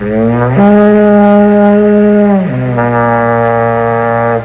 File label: horn; The Fraggle Horn